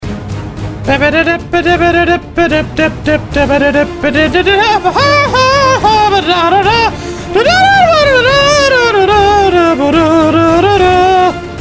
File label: intense serious music